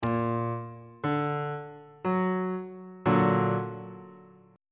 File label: f sharp augmented 1st inversion